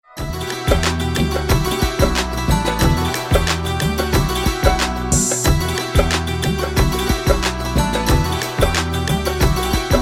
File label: Classical Solo